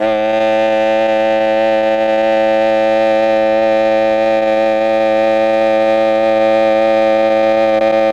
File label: MEL OBOE